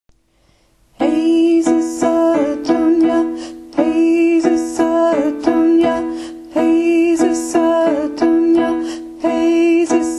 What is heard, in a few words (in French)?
voix alto et basse